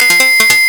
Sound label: off button